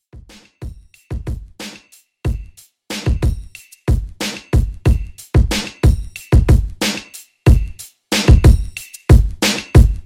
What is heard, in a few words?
Old School Hip Hop Redrum; Remixfreakz